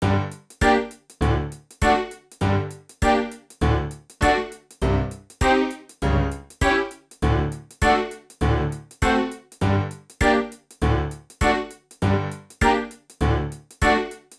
怪しい奴からの誘惑, BGM

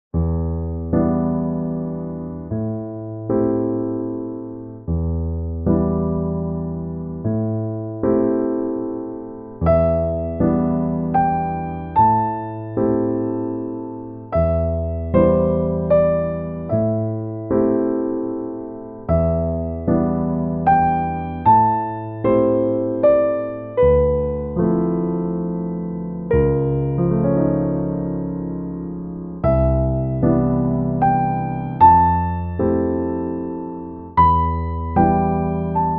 mayoi, bgm

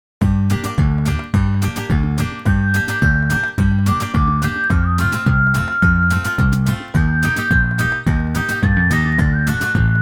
kouya miti, bgm

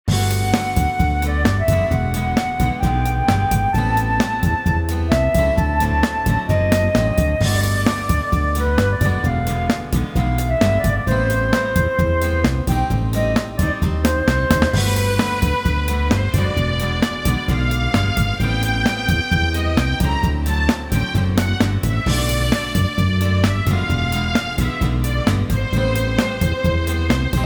osyarena town, bgm

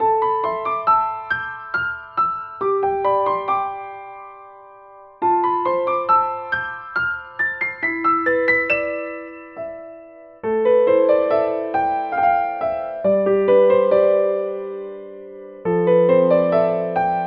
bgm

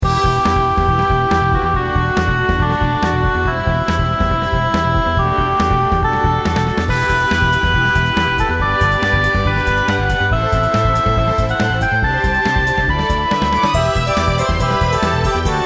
yuruginai heart, bgm

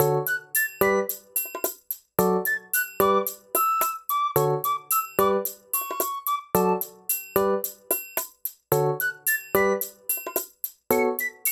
bgm

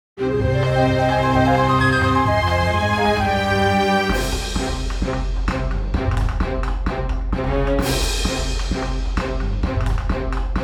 忍法戦闘の術, BGM

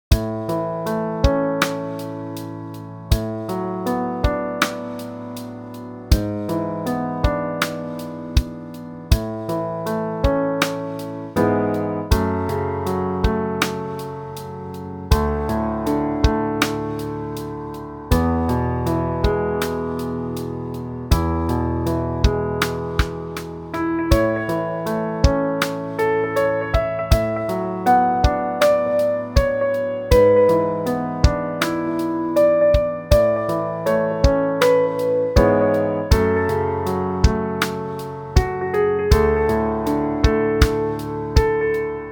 真夜中の公園, BGM

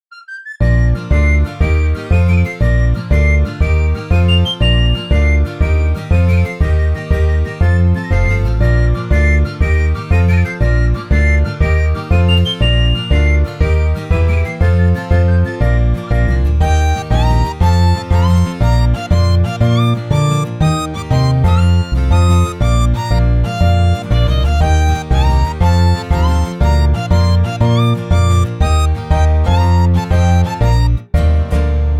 nezumino bouken, bgm

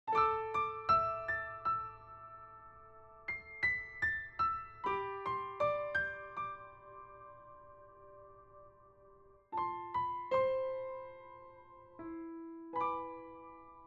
wakare, bgm

